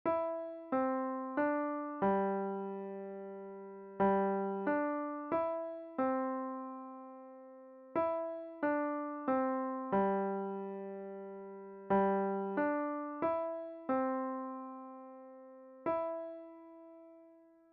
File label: London Westminster, klavier